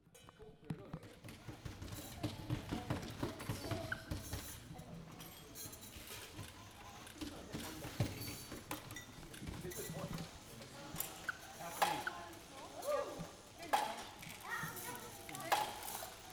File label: Basel Tinguely Museum